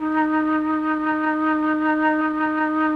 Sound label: MLTRON FLT, MELLOTRN